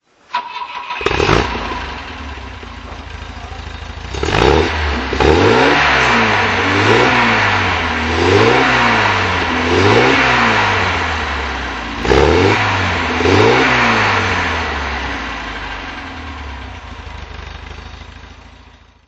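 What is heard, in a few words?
volvo p1800es throttle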